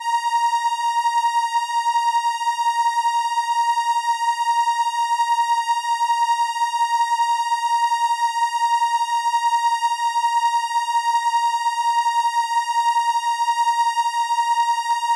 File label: Viola A#6, ARP Solina